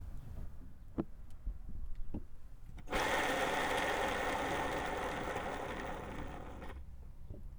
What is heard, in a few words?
Skateboard Wheels